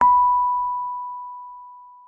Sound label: MK1 B5, RHODES; Xsample VOL-1